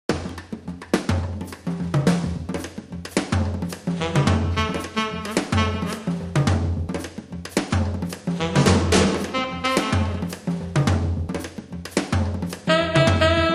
song jazz; WMBonusXP

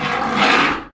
boar; kult

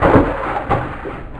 water; Fishing Flash Cards

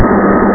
grind

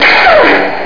punch; Dark Forces; LIGHT Saber

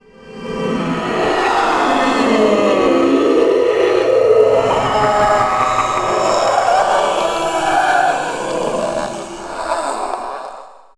haunt; Thief II

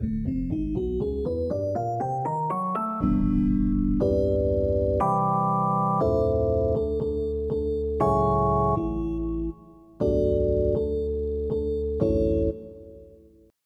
Hats 2; Onj's Soundfont

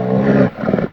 bear; kult

